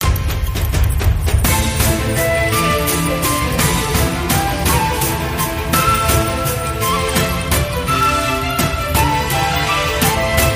big win; Wild Ape

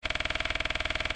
GAMBLE CARD BLINK; Ultra Sevens

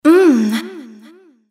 moan; Imperial Girls

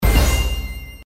7 hit; Vegas 777; Seven Vegas

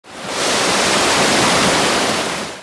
waterfall; Bavarian Forest

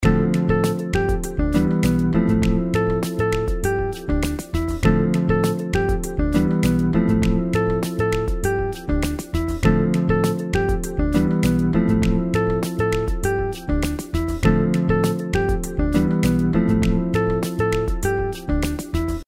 outro bgm; Stocked Bar

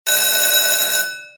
RING LONG; Helena

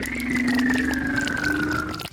reel long spin; Hungry Shark; Wazdan

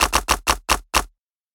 risk roll card short; Fruit Fiesta; Wazdan

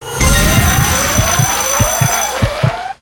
megawin; Hot Choice

